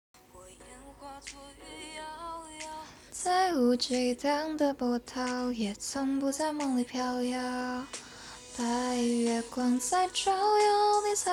翻唱

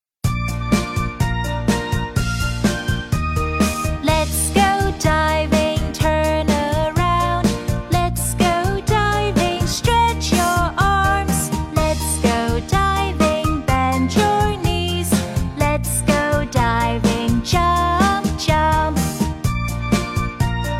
英儿歌女童音色

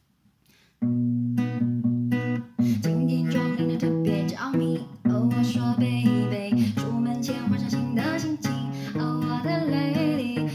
随意弹唱

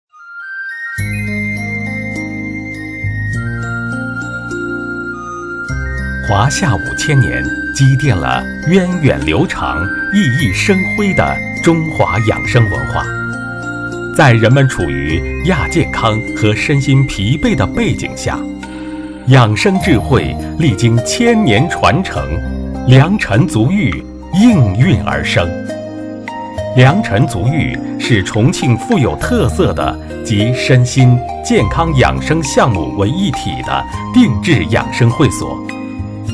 足浴配乐

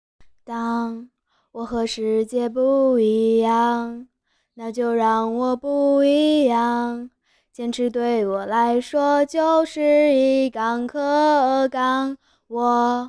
配音歌曲

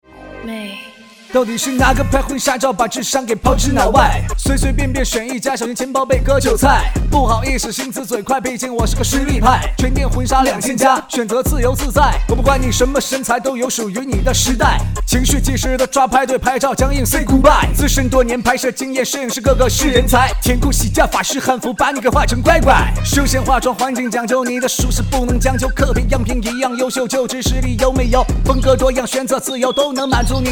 说唱; 电梯战神改词

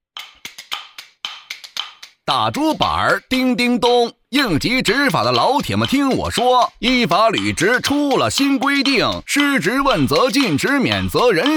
快板

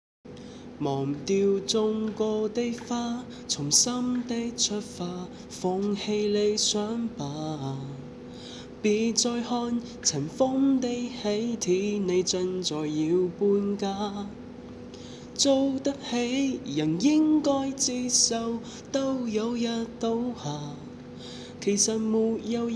粤语歌

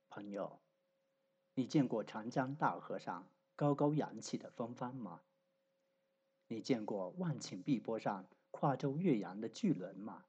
船歌

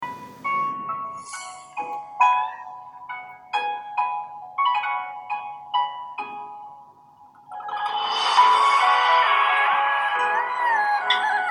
翻唱片段

